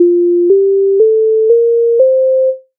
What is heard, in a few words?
f scasle; Мелодії